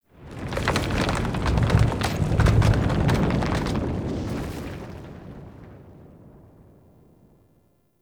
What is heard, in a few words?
Earth Quake Rumble; Warframe; Tau